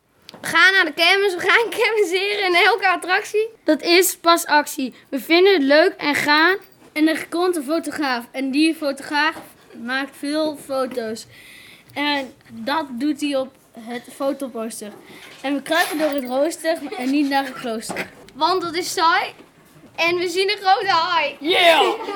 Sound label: rap